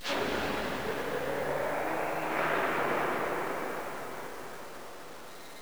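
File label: ambience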